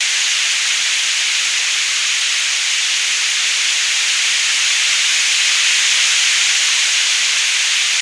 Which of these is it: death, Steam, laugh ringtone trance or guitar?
Steam